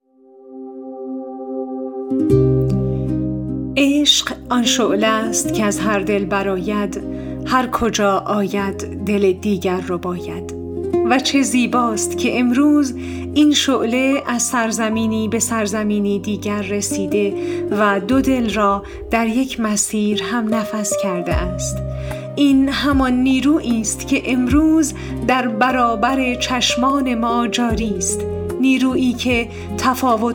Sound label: aghd persian ceremony music sample